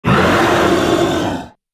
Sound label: cave monster roar